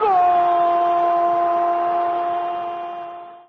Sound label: goal